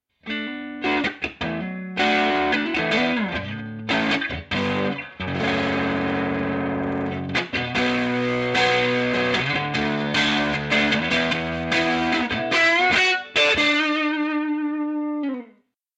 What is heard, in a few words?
overdriven